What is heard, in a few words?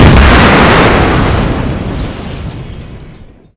f-15 explode